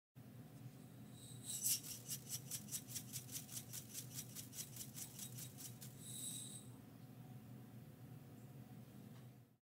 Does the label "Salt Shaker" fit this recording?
Yes